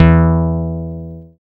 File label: BAS MKS-50, Roland